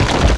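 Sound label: mausoleum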